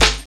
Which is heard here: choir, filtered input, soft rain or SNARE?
SNARE